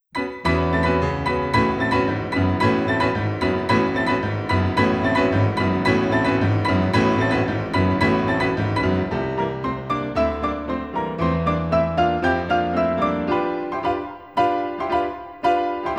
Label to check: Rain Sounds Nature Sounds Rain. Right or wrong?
Wrong